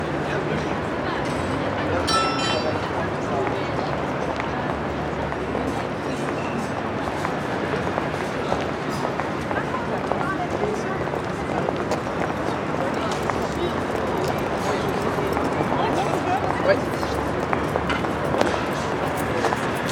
Amb Rue Pietonne, French; City

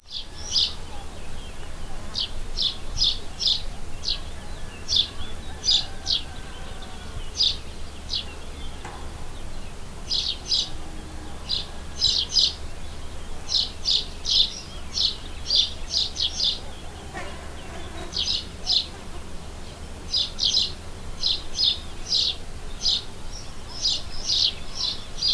Atmos champs oliviers Oiseaux, Countryside; french

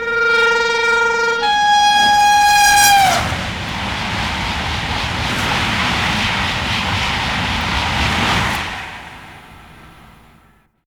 Pass Tgv Proche Klaxon; french

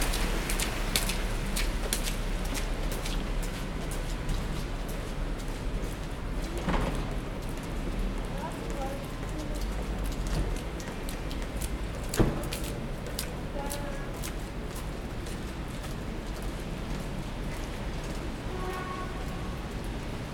Amb Rue Apres La Pluie; City french; Amb Rue Gaite Apres La Pluie

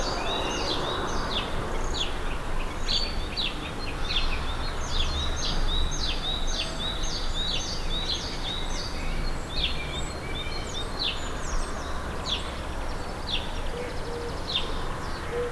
Amb Air Oiseaux Jardin; French

